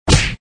Hit; mega boulder rampage gamerip

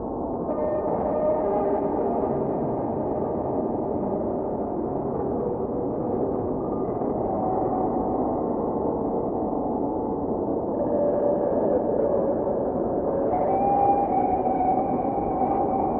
Danger Tonal; Insanely Twisted Shadow Planet

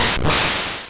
Smog; sfx; attack moves; pokemon; gen 1; rby

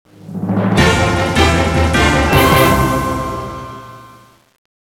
Fanfare - Success; Yu-Gi-Oh! World Championship 2007; DS

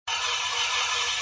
Grind

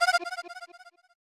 Start Game; Creepy Bird; SNES

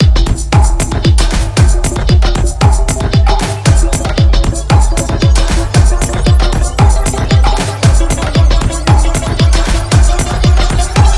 Facility Background Music

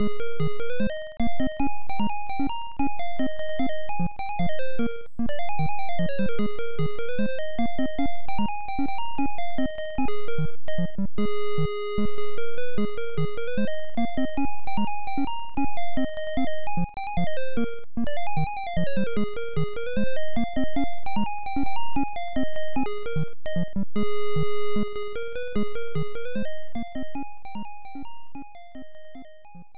Wolf Falls; Pooyan; Family Computer

